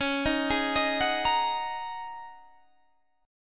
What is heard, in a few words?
Ab 9th Suspended 4th Db